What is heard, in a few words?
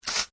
household aerosol can spray; Spraying; Objects; McKinney Sound